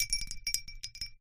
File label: glass ice